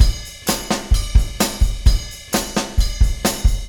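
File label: ride beat, Room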